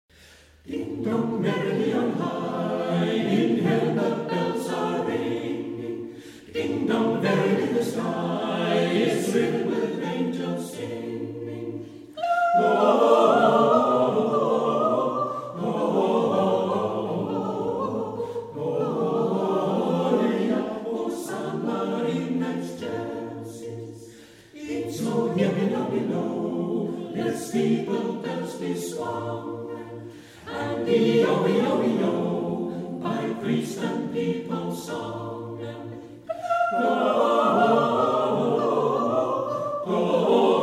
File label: Ding Dong Merrily